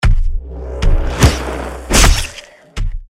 attack; hero sound

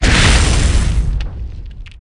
skill fire, battle

